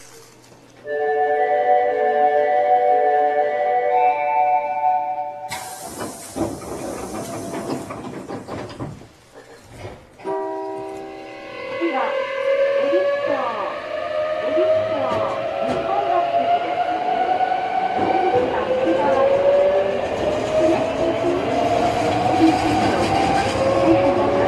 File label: hankyu 8412; vvvf; toyo denki